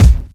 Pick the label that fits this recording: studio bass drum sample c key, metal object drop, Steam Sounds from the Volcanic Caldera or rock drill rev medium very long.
studio bass drum sample c key